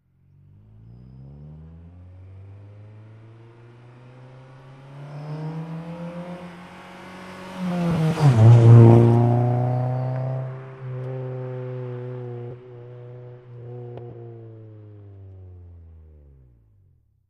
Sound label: Integra, slow approach, medium accelerate by, long away, Acura Integra